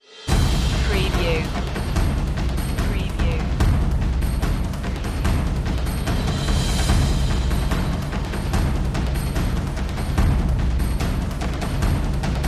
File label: perc mix